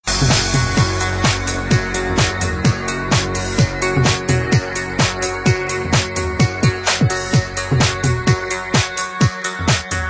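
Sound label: Hip-hop Guitar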